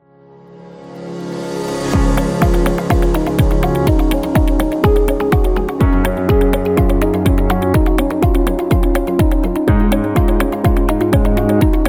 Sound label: Motivational Beat